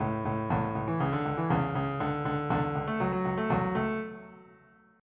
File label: Reggae